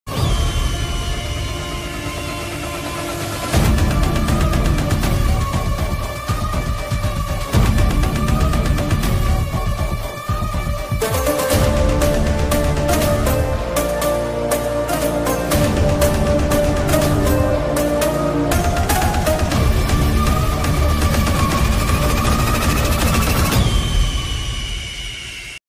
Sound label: BGM ringtone